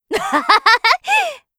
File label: laugh; Eternal Return; Hisui; KOR